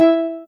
soft hitwhistle; osu

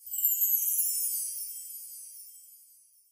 sparkle

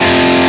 F; osu

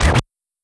normal hit normal

